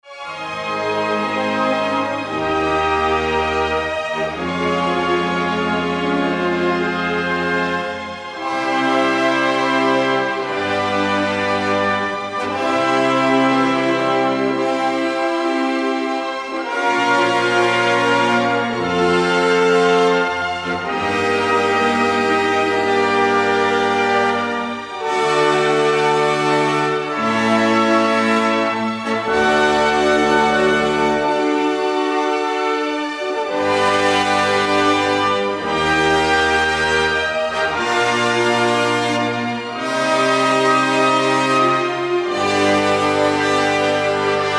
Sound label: Chorale